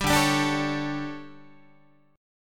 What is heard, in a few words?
D Major 7th Suspended 4th, Guitar; DM7sus4; strummed; JGuitar